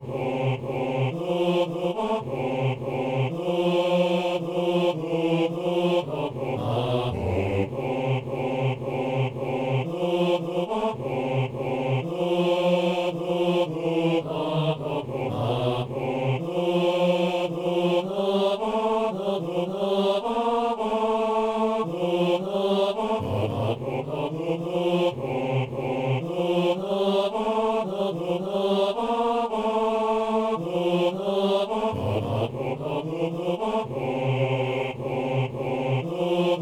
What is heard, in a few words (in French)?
basse; Il est né, le divin enfant; Partition en fa